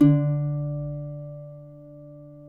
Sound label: cuatro; Spectrasonics; Hans Zimmer Guitars VOL-2